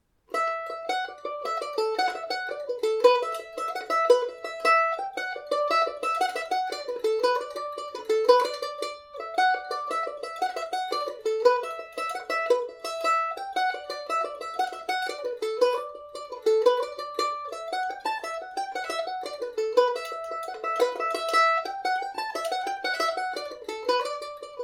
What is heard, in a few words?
D, Irish mandolin; leg of the duck